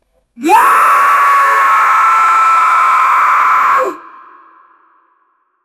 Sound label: a goblin screaming angry